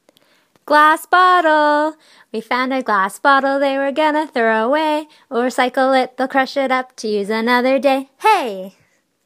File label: Glass Bottle Cheer; Environmental Concerns Committee